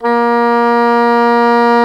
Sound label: Cor anglais; CMI